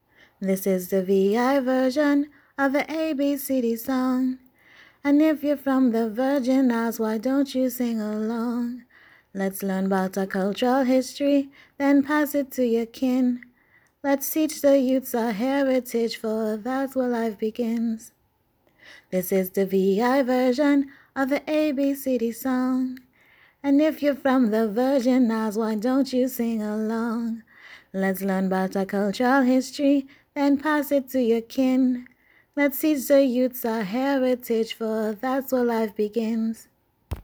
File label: Chorus